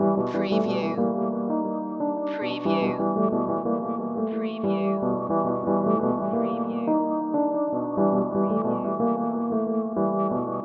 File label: Hip Hop Dark Rhodes Licks 90 BPM Straight - G